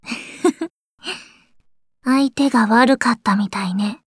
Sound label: cheer, voice commands; svencoop; Blanc